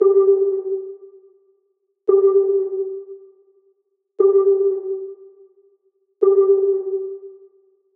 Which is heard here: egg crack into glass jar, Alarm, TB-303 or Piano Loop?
Alarm